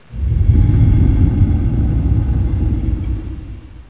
alert, lurker, monsters; AoMClassic

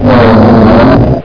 pain, monsters; AoMClassic

